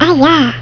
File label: Eyah; Concentroids From Planet X